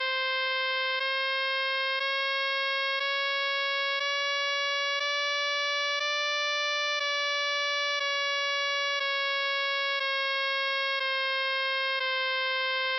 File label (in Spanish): do re do sextos tono 11 parciales